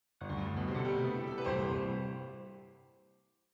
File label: Quick Piano End